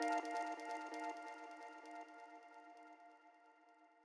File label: Synth Hit